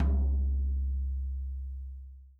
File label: tom, Real Drum Kit, funk kit